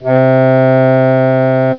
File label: overtone